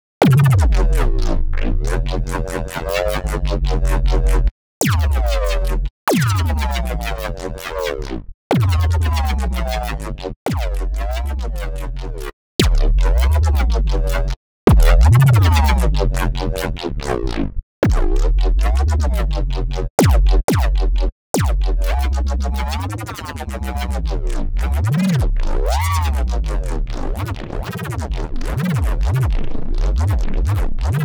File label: Sampler spew, Neuro Bass; GranularSumo Neuro Bass Pack 01